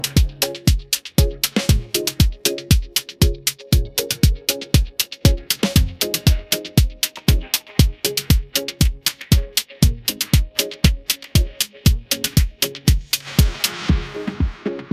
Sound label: ringtone afrobeats